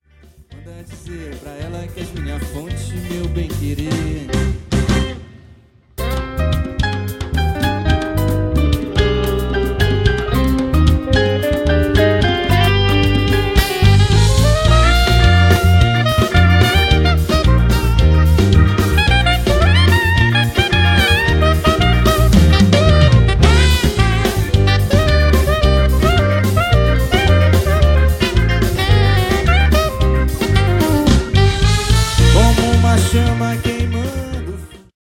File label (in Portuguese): sax soprano solo